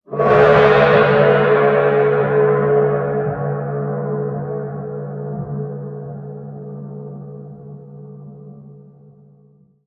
respawn

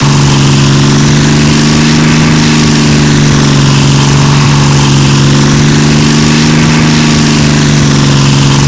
v12 diesel large, engines; acf engines

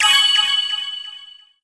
complete

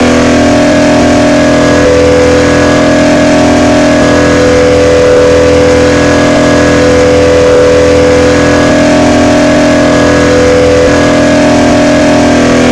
v12 petrol small, engines; acf engines

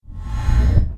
aim; vadim blink

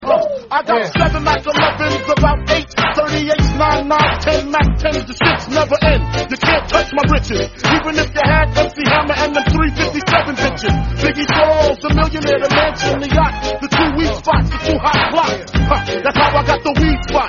song

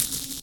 panel electrical wire

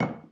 place jar

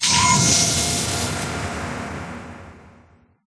pickup

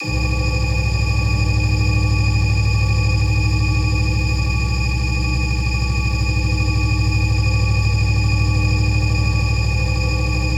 electric medium, engines; acf engines

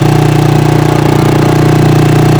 i1 medium, engines; acf engines

